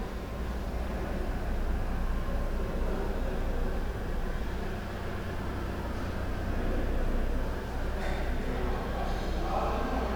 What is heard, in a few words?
grand council hall, ambience